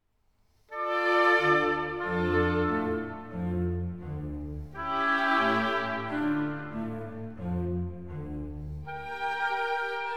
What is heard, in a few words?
Concerto for 2 oboes, strings + b.c. in D minor - Largo; Oboe Concertos Vol. III